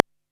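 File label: SQUARE CLICK; SFX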